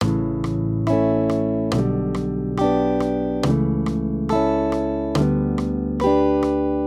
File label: maj triads